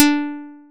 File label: plucked, programmable speaker